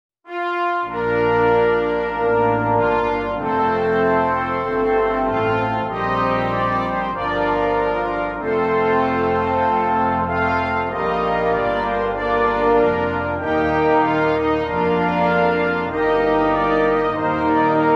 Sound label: Borehamwood Hymn - Brassband